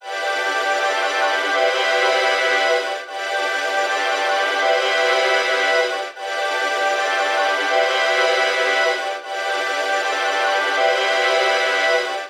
78 F Strings Groove

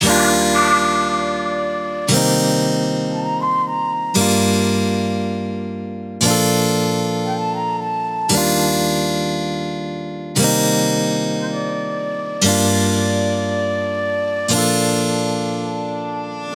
116 guitar flute Gm

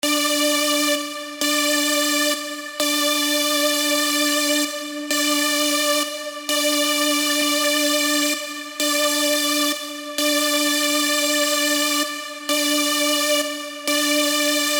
Poly Top

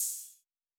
OPEN HAT

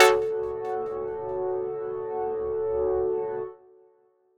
F-0 Lead

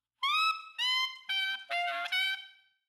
sax pop 112 bpm G major G minor C major F major

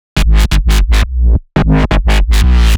172 G- Synth Bass

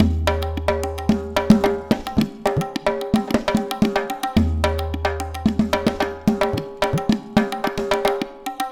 Percussion Ensemble 110BPM Ensemble Natural

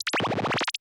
fx oneshot siren sweeps complex osc short dry; Deep Space Textures

